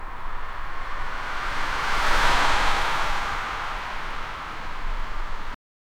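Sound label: strong wind whooshing past; motion blur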